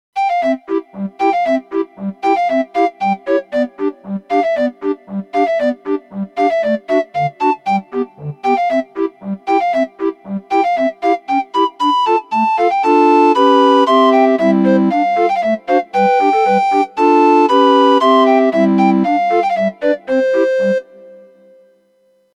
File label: recorder